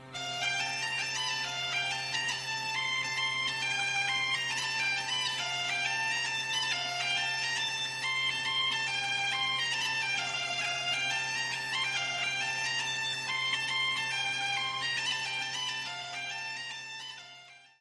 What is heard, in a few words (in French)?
Mazurka du Pays-Bas; France